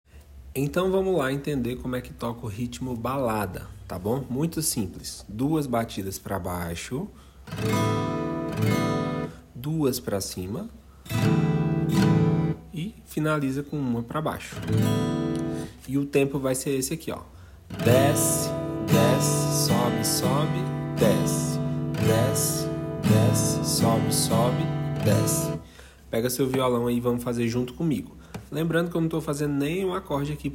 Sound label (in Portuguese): RITMO